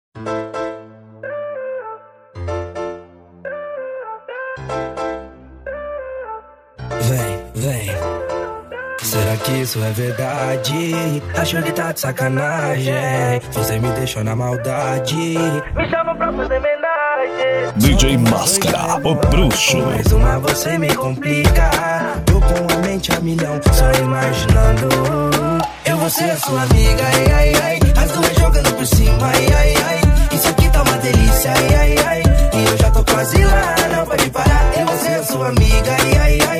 funk hits verao

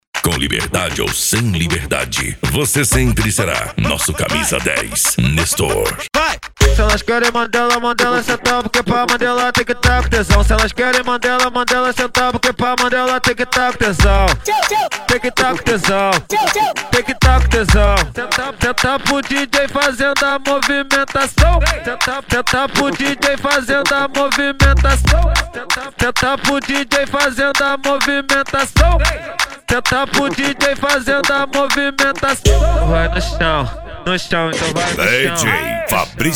funk e lambadao

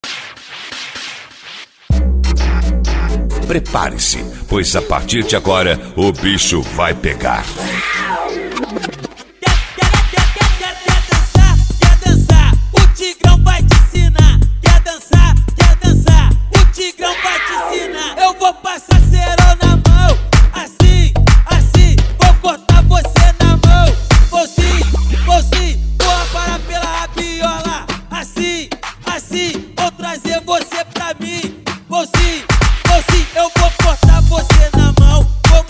funk mega funk

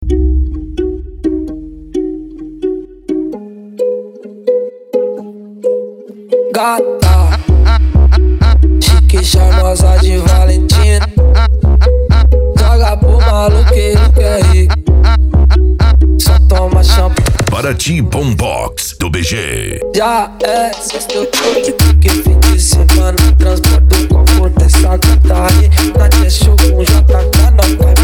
bombox funk

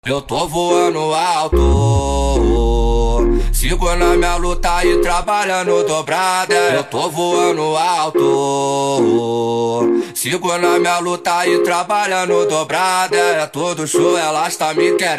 funk e mega funk; seco polimentos

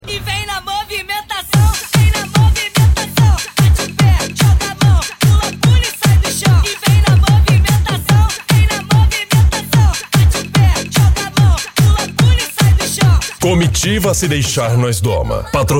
funk bass

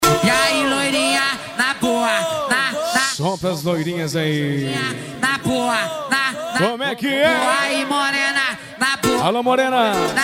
funk pisadinha sertanejo

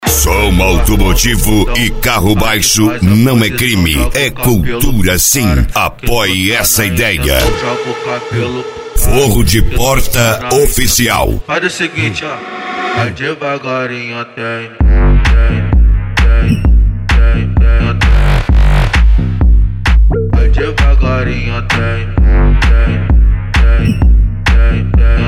eletrofunk; forro de porta 2k21

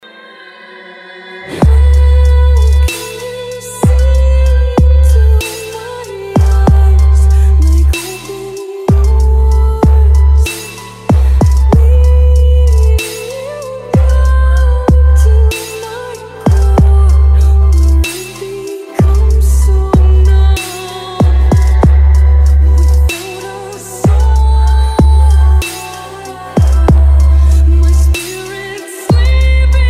sound car mala aberta e mega funk

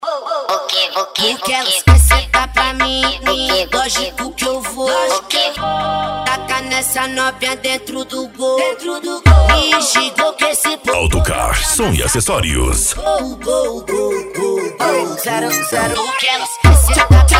funk agressivo ritmado e funkadao

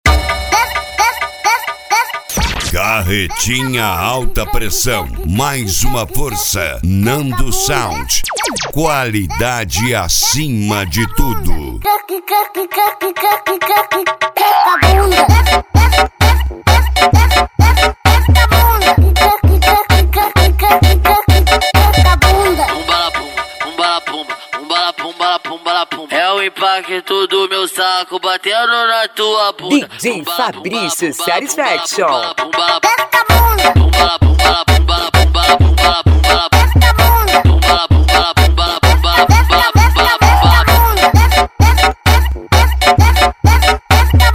ritimada agressiva

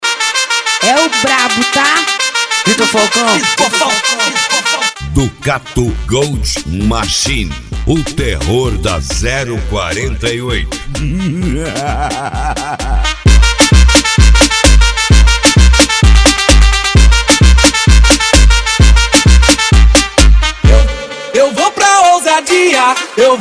especial funk

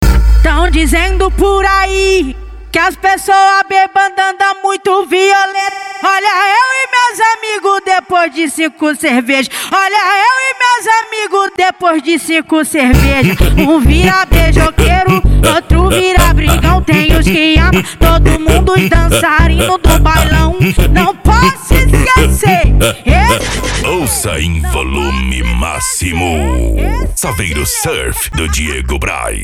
funk consciente e funk ritmado

